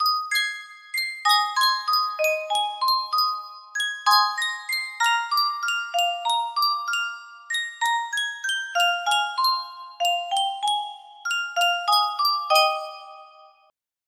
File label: Sankyo Music Box, music box melody